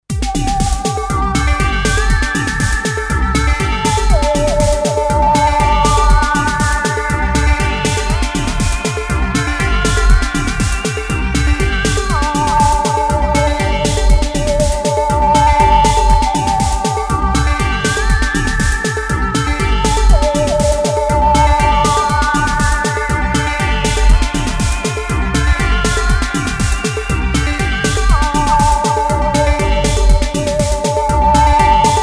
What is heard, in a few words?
formant; emx1